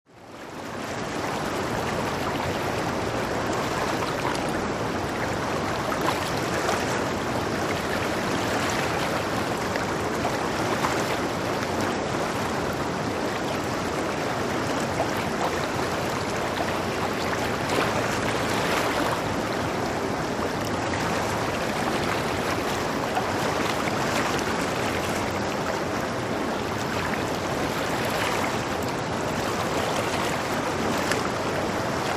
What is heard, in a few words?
Water Running Under, water flow